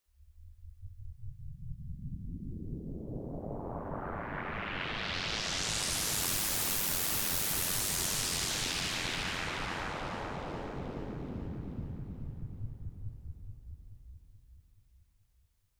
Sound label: Pink Noise Effix Delayed; Techno